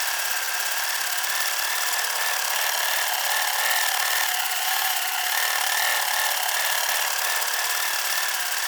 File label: Nail Grenade Loop; Trapper